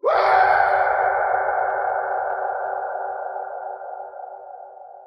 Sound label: Horror Scream; Krucifix Productions LLC